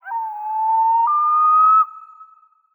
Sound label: Lonely Howl; Ed Edd n Eddy; Adromulus Pack; EEnE